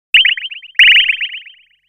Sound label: Signal, Nokia Tones; Windows Phone apps